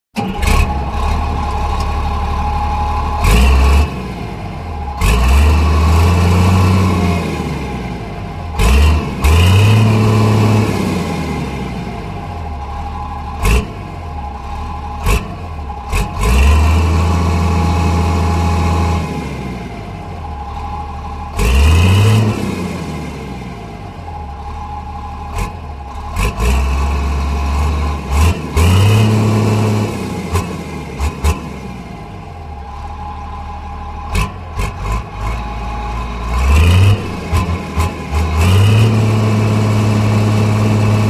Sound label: Bulldozer; Start, Rev, Off; Bulldozer; Start / Hollow Revs / Idle / Turn Off, Close Perspective.